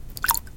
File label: water